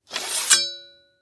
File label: Warfare Sword Sheath Remove Scrape; Sword Sound Effects; Swords (Sound Effects:Combat); SFX Bible 6